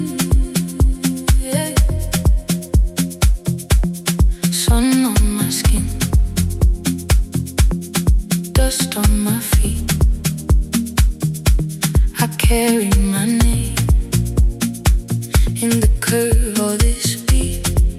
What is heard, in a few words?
AFRO SOUL; PULSE